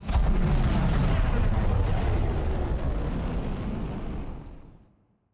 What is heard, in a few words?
kaiju superweapon fire whoosh; biogenesis